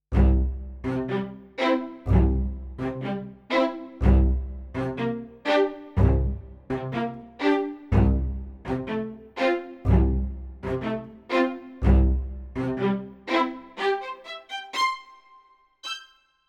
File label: Strings Short; labs strings